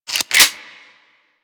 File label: Lex Prime Reload Cock